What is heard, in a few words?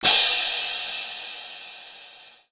Crash, impact samples